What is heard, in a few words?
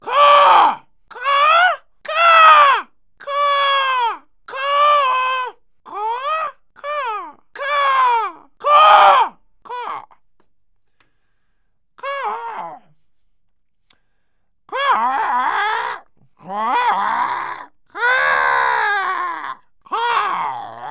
TCrow, Crow Sounds